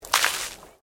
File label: Water Splash Concrete Small; Elemental weather effects; Nature